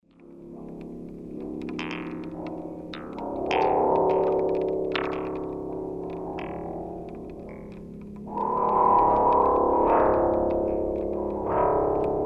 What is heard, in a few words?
mild rain n wind